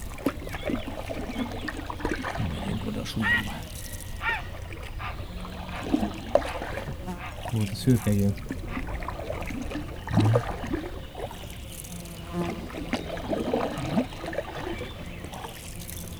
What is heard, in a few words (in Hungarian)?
szürkegém tiszabábolna; termeszetben; allathangok